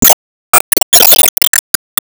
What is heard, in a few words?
Enemy Teapot Teacup Drink